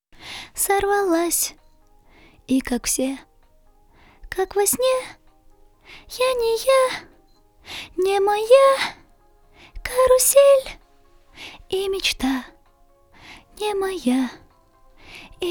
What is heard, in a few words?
Verse, acapellas